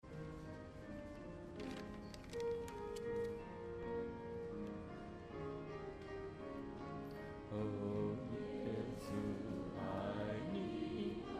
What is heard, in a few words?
bass